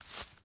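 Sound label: footstep bare grass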